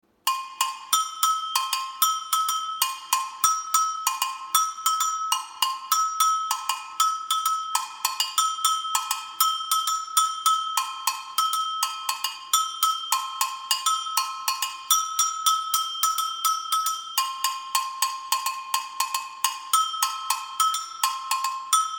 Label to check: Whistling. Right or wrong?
Wrong